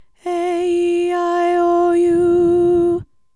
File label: aeiu o Mid